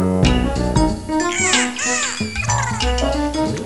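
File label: dolphin; ABC World Reference Wide World of Animals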